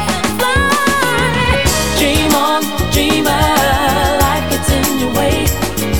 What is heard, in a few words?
music